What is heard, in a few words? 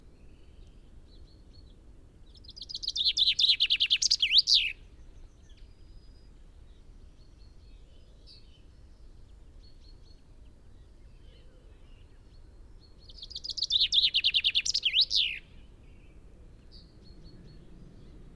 Common Chaffinch Fringilla coelebs Ryedale; David M